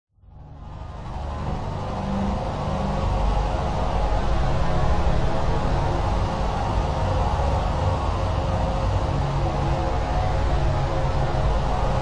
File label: science fction forcefield energy source pulsating agressive menacing droning